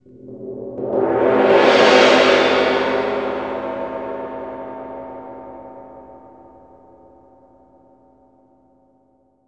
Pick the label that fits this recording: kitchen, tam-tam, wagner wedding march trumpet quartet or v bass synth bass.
tam-tam